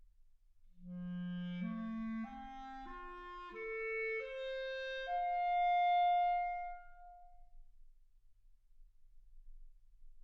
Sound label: clarinet